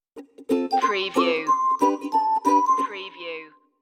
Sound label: reggae happy stinger